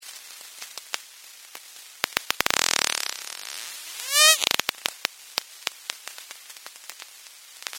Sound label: harbour porpoise clicks